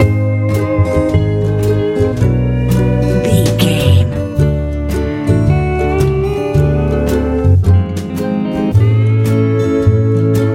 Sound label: powerful warm swirl big love tropical